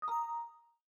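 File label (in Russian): звук Windows 7 ошибка error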